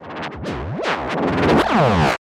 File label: stylized glitch sound; soundscrate